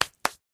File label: hands; taunt; player; yeti standee; sniper; source engine; tf